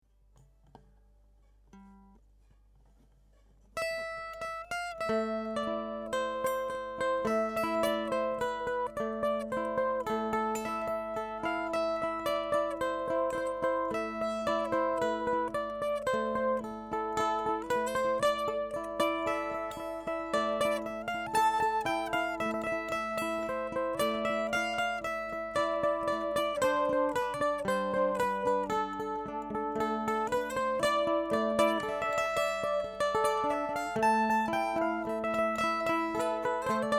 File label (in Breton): hanterdro